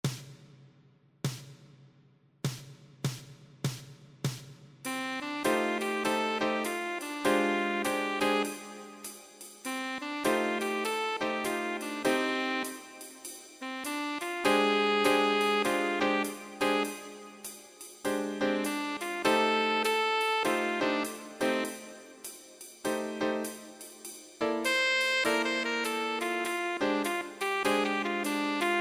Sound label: PLAYALONG BASS